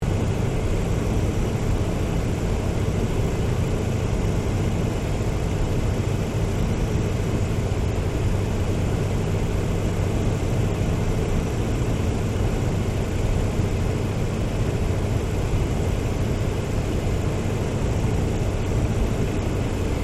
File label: AIRCRAFT 1916 SOPWITH PUP REPLICA FLYING LOOP INTERIOR STEREO; Airplanes; Vehicles; Replica of a 1916 Sopwith Pup; Aircraft Collection